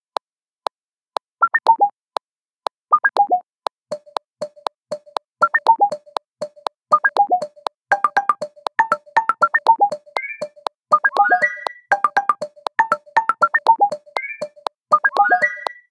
Beats, ringtones; Google Pixel 4; Pixel Sounds

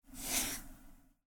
Delete; Xiaomi Redmi-Note-8-Pro

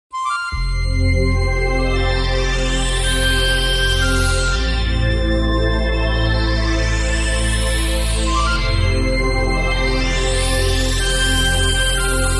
Chinese Ink Painting, ringtones; Walton; Primo-F3i

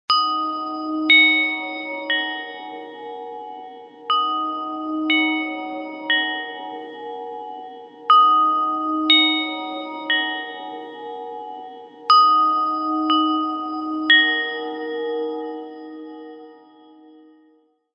Bells, Ringtone; HTC Freestyle

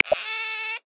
Giant Otter, Amazon; Xiaomi Redmi-Note-8-Pro

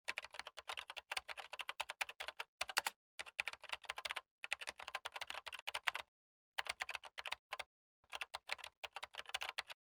manner keyboard, ringtones; Samsung Galaxy Tab SHW-M180S

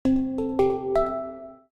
White Swan, notifications; Huawei Mate XT Ultimate

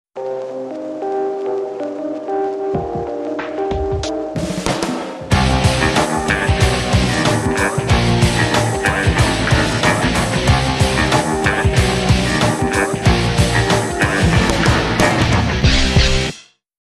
riff; Motorola Q

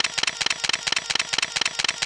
Multi Shutter; LG GB255g; Event sounds

